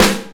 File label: sizzle steel snare drum sample g key, Royality free snare drum tuned to the G note.